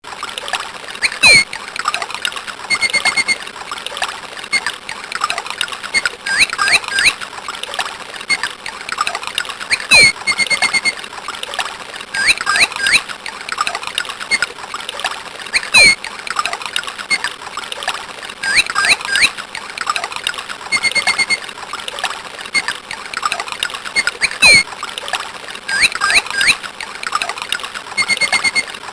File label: Chord B